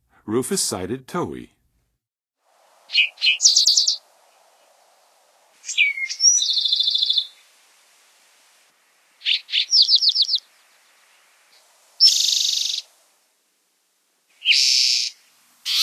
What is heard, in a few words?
Rufous-sided Towhee, Songs of Birds of North America; Western USA; Western USA Songbirds